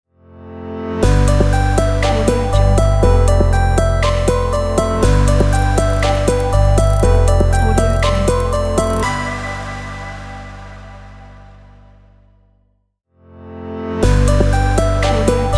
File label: electronic innovation logo